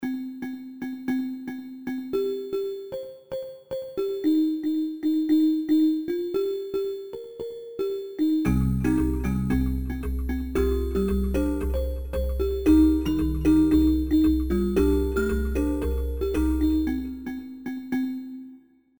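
crushed kalimba, SIZZLING NOISE, It's like a campfire - a warm crackling and organic noise - all coming from the tubes of an old tape echo.; CINEMATIQUE; KLANG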